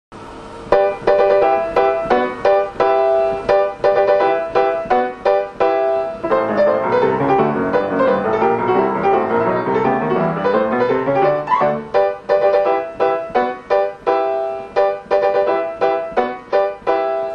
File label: marshal player piano